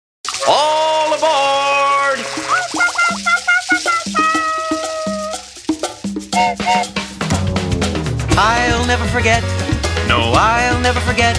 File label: Alphabet Song